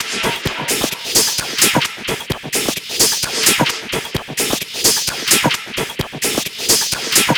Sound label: Perc Loop 130, techno